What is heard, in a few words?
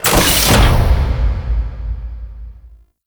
Gas City Large Door Open; Warframe; Corpus Gas City; Gameplay Remaster; Ambience